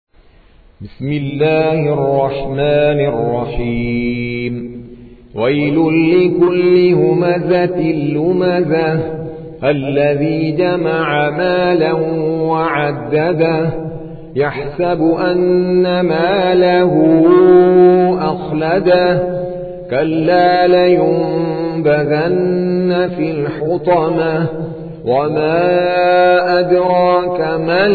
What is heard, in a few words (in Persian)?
ترتیل قاری هندی; سوره همزه